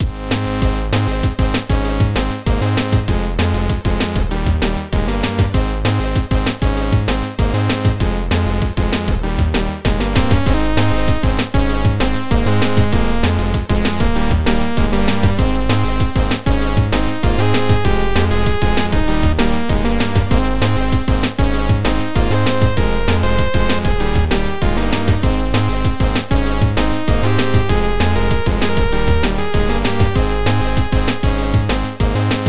keygen song

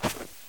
dirt; player

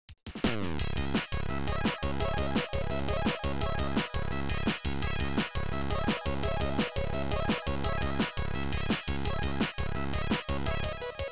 keygen song

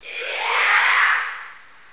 phantoms

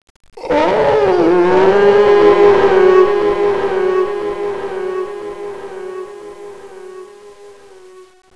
scream

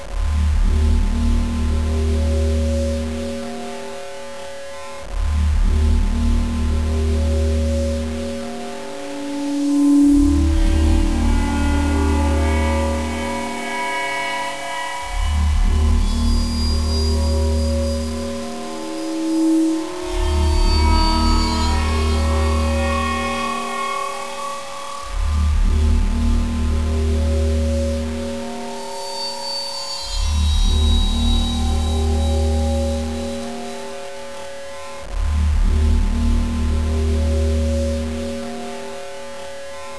amb

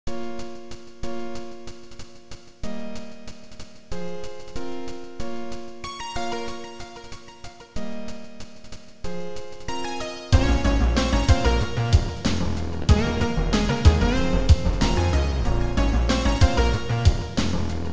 keygen song

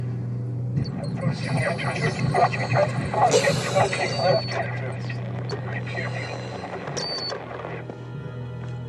Radio

